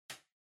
Light Switch Rocker, Far Dry Mid

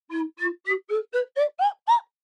Slide Whistle Phrase

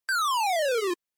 NES RPG Warp

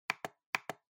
Trackpad Click L Double

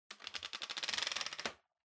Vacuum Cleaner Pipe Shorten

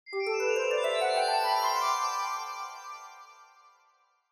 Scene Change Low Up Slow

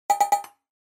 Percussive Accent; 打楽器アクセント

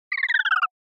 Motion Slide Down

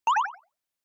Short Accent High

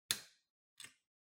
Light Switch Wide Panel, Hall Gentle

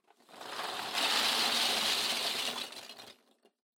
Loose Fill Packaging Put In Box; 梱包材

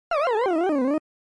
Arcade General Pitch

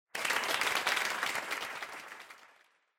Applause Far Short

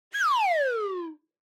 Slide Whistle Long Down

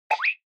Motion Eating

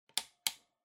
Electric Fan Angle

